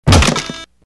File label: Stop Explode; Spastic Moose